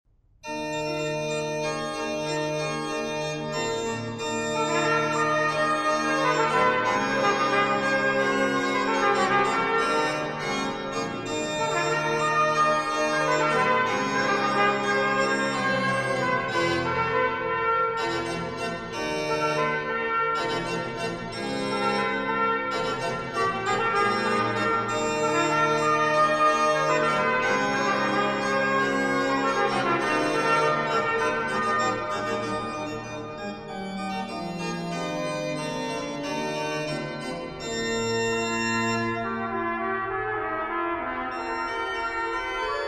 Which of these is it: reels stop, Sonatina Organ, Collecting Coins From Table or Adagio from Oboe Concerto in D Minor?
Sonatina Organ